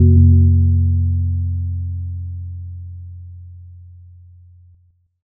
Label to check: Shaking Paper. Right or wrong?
Wrong